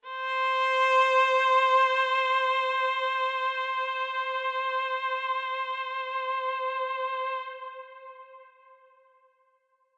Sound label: Cello Verb C4, verby solo cello